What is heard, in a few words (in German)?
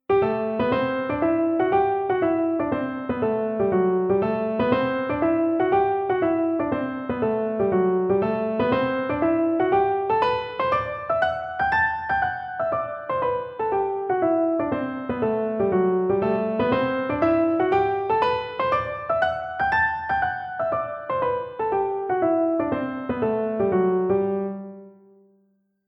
Tonleitern Spielen G Dur; Paradiddle Kapitel 1; dotted